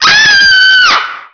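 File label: Civilian Ahhhhhh, female; Army Men; Civilians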